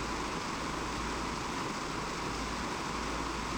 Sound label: Packard Merlin Prop Cockpit Prop In; DCS